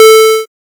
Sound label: safe; inside-house; safe puzzle